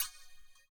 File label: CYM, Ethno Techno; ILIO - Ethno Techno